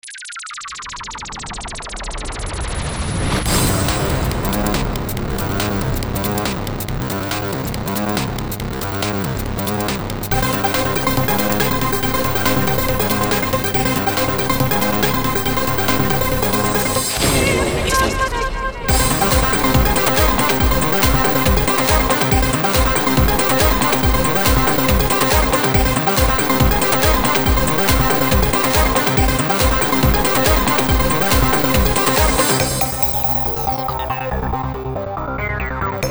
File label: synth seq